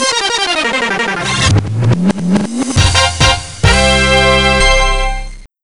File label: Level Clear; Spaz